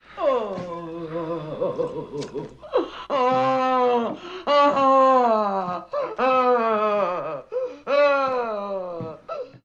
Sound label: crying; pazuzu